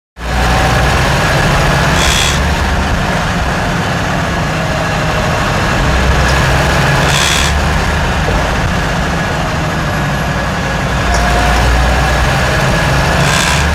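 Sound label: super annoying rattle torsion bar, hummer h3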